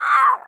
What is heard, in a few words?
Monster Rabbit type Death